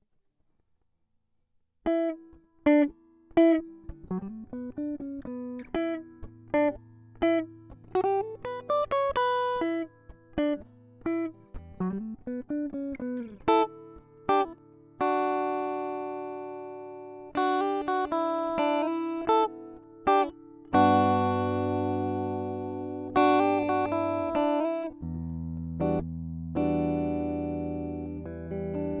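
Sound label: Em 124 guitar jazz riff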